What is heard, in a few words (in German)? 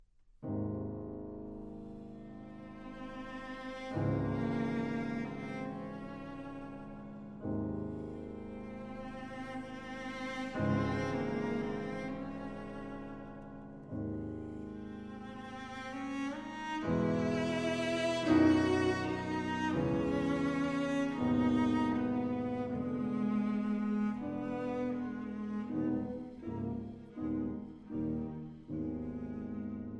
Klaviertrio f-moll, Poco Adagio; Dvorak